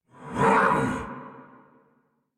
Attack Effort; Warframe; Dax Heavy; en

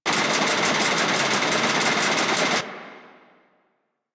Fan Blade Loop; ambience; Warframe; Grineer Galleon

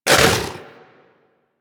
Vocal Tranquillized Grunt C; Warframe; Hunting Critters; Vulpaphyla

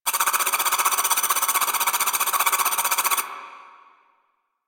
Rifle Projectile Loop; Sundial

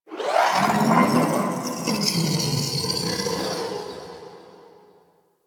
Sentient Lair Exterior Creature

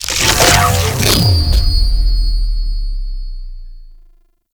Portal Open; Enemies; Sentient Dolicholyst

